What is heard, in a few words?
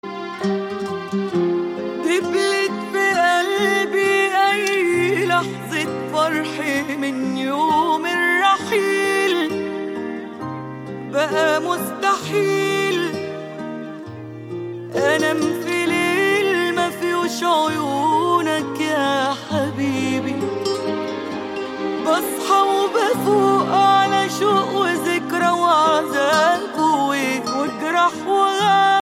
arabic ringtone